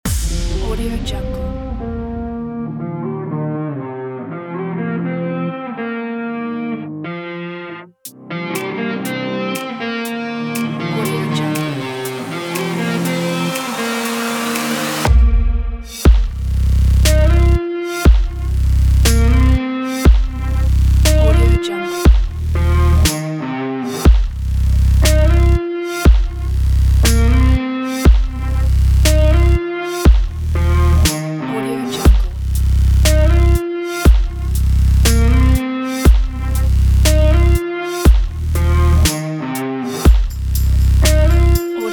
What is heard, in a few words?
fashion sport rock